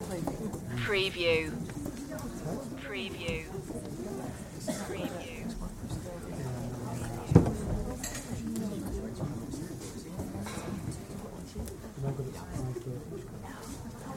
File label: Audience Chatter Crowd Ambience; beanstalk audio